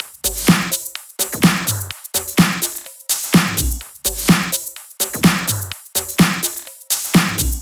Thai Kit 6, 126BPM; Vengeance Samples

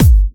Bassdrums Breakbeat; Breakbeat Kicks; Vengeance Samples

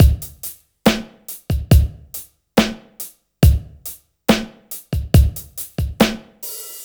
Slomo Beat 140 BPM; Loops; vengeance essential club sounds-3; Slow Motion Beats

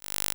Offbeat Bass G; Vengeance Samples

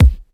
Bassdrums

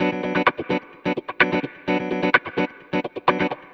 Electric Guitar - B min, 128BPM; Electric Guitar Kit; Vengeance Samples; Special Sounds

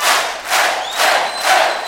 House Loops, Vocal Loops House 128BPM

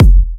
Bassdrum; Vengeance Samples

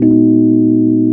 rhodes D#, vocal house; PS-28 Vocal House Samples

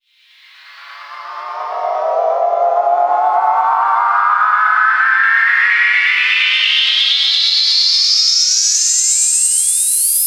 Sweeps Long

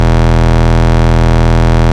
Root E, Bass

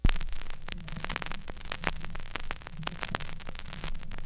Record Noise, Vinyl Record

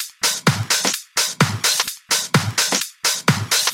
House Loop, 128 BPM; Vengeance Samples

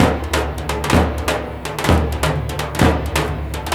Latin House Loop 128 BPM; Vengeance Samples

